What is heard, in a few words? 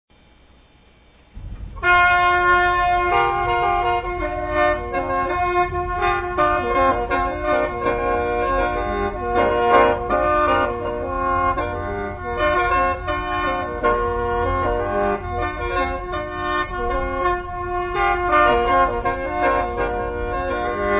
Khaen song, Lao Khaen Music